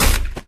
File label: Landing Splat; Scrat